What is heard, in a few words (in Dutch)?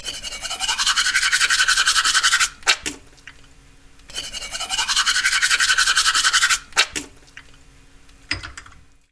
tanden poetsen